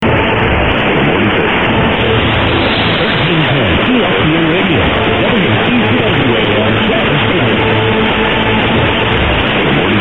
sync am; 1310; wccw; traverse city; perseus

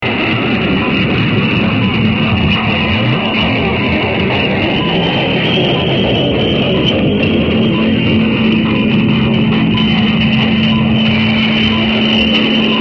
time pips no id ecuador

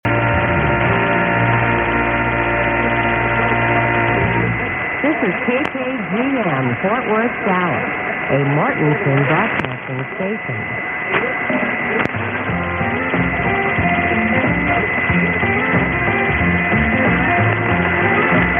1630, mw dxing; kkgm; fort worth

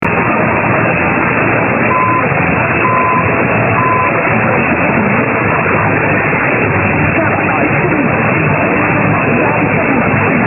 pips; 1470; cjvb

